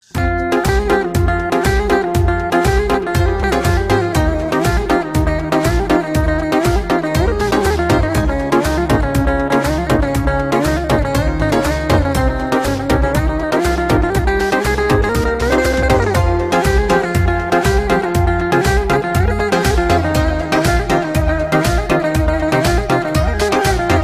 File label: ringtone, gitar; saz